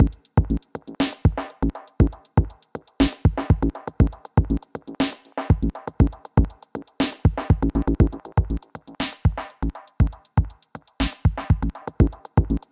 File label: groovy groove